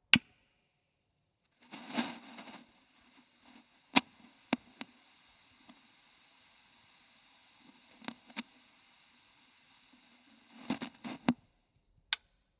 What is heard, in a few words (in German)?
Portables Radiogeraet TS502 an ausschalten